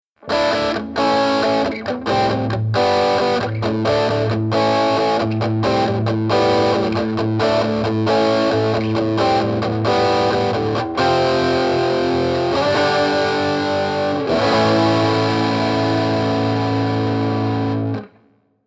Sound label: boss sd-1